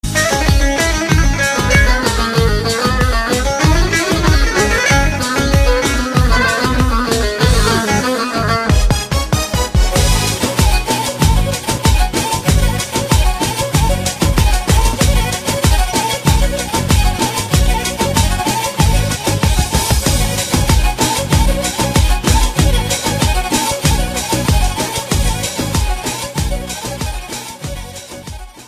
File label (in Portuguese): Música Turca